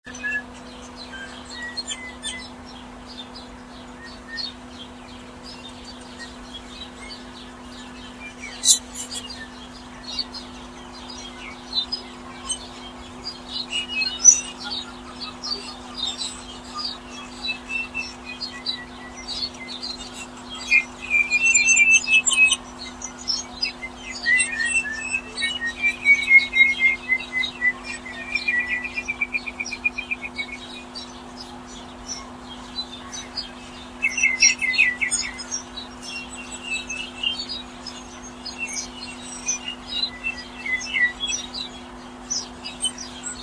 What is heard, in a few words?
Galilee birds dawn